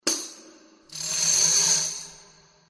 tambourine

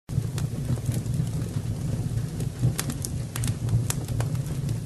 fire burning

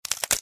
egg cracking short

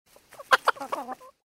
animals chicken clucks

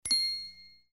ding

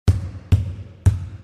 basket ball gym

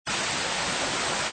rain falling sound effect; smartkindertech

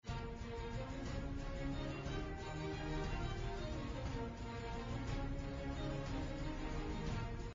fantasy medieval music

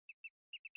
animal mouse rat or other rodent squeaks

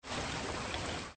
ocean waves crashing on the beach sound effect

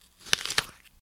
apple Bite; Simon Craggs

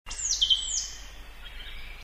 birds chirping

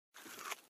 person crunch on food amplify

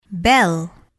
bell

